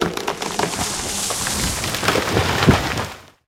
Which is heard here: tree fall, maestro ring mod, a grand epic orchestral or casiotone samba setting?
tree fall